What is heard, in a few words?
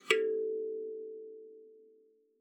KALIMBA A4; Xsample VOL-7